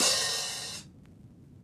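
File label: Vinyl Open Hat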